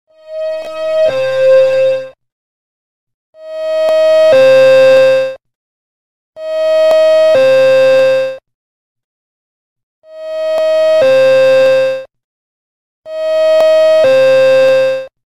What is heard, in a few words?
Clock Alert Classic